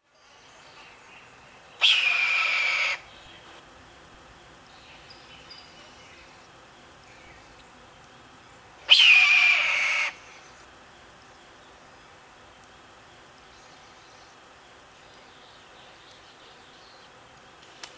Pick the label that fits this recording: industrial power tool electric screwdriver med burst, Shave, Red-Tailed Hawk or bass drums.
Red-Tailed Hawk